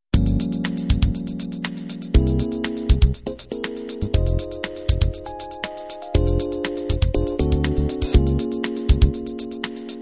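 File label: standard 16 beat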